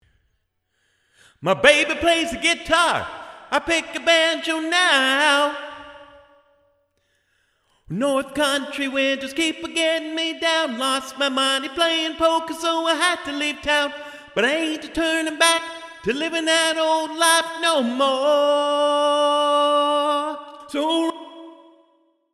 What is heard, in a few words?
Mid solo